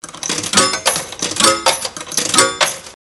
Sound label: ROLL